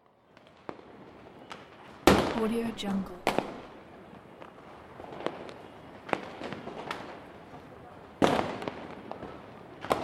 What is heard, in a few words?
fireworks ambiance